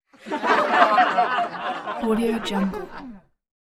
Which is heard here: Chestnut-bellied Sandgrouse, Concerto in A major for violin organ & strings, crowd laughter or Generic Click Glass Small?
crowd laughter